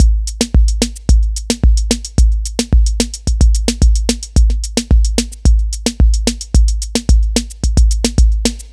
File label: 110 drum loops; DemDubbin; M8; Electronisounds